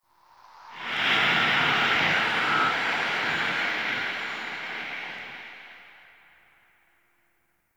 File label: Corrupted Ode Wind Gust Toxic; Ambience; One Offs